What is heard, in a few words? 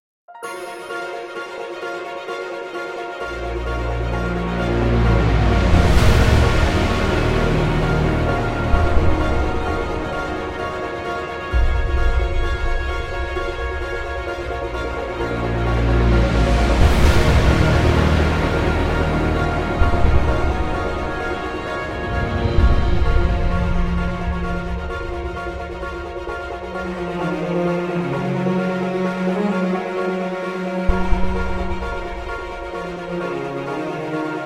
No Cello Solo